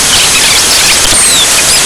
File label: BATS